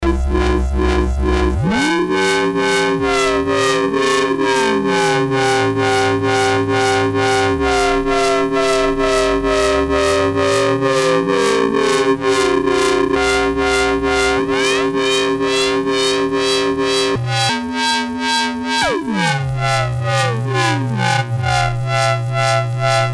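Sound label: LFO metal, Polivoks duophonic synthesizer; LFO METALIZER; Polivoks formanta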